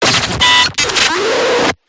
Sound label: distorted glitch; PMSFX